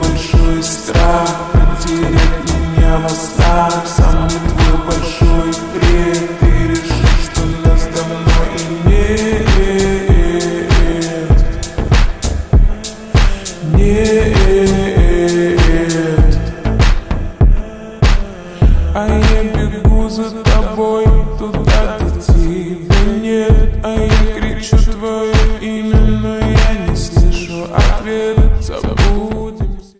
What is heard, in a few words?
slowed